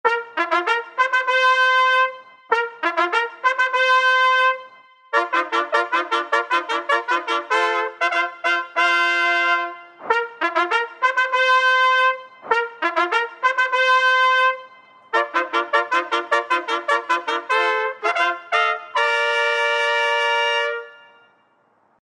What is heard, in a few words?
fanfare, brassworks band